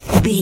item pickup swipe

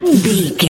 voice male breath struggle

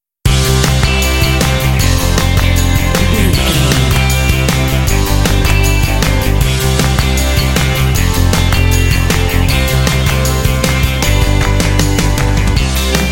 eighties pop stars

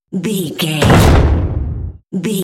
cinematic drum hit trailer

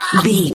voice female hurt pain choke

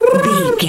pigeon emote

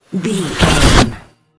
magic conjure charge

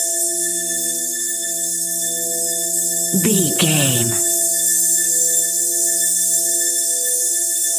shimmer sparkle loop

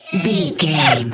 goblin fairy effort push fast

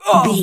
voice male grunt pain

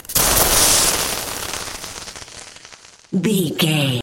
elec lightning magic spell

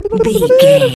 voice fun character flutter man

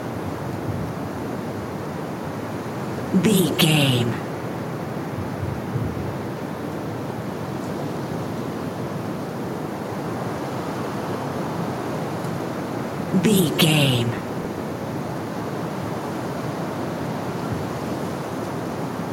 wind general gusty high loop

